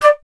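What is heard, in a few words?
flute D; Miroslav Vitous; Solo Instruments VOL-2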